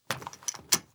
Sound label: Seatbelt; Fairlight Sound Lib